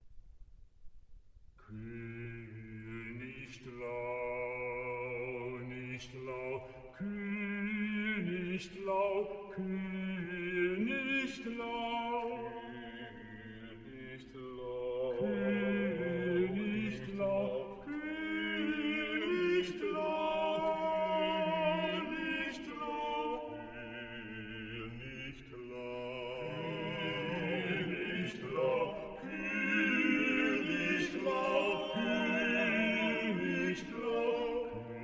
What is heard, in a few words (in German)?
Dreistimmiger Kanon; mit den Anfangsnoten B A C H; Kuhl nicht Lau